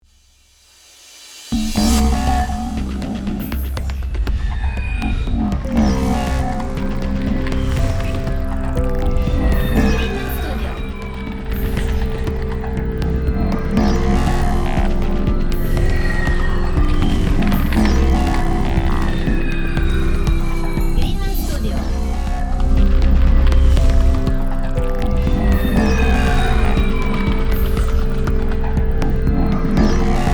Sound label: bubbles